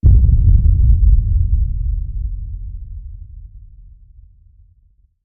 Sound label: Hit Super Boom